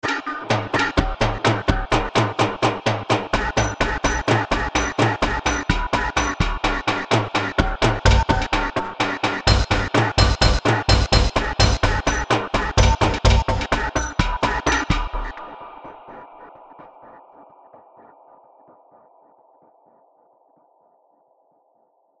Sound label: Tribal Eerie Staccato Rhythm; Composition; Blastwave